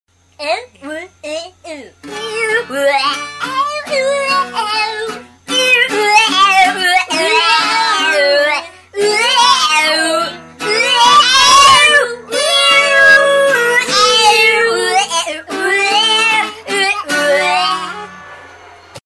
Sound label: "aaahuhohoooooh"